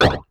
Korg, FX

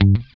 GUI G2, E-Gui Muted; Sound & Vision; Gigapack I CD 2

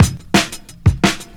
175, Breaks; Drum&Bass; EZ Rollers

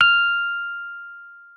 F6, Rhodes; Xsample VOL-1

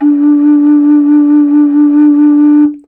moc d3, MOCENO BASSFLUTE; PS-52 AIFF WORLD INSTR 2; WOODWIND AND BRASS

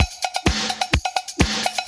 Latin Loop 128 BPM

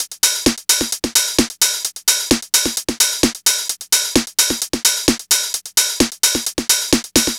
NRG Percussion, Trance

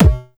bass drum, Drum & Bass

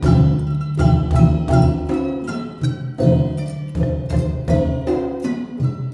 MALAY L, Heart of Asia

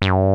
BASS, BASS STATION; Syntec; Wall of Sounds

